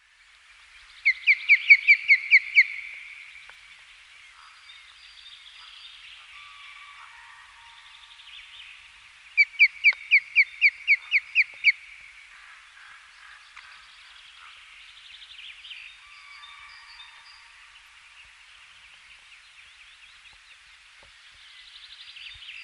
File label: Osprey, Pandion haliaetus; raptors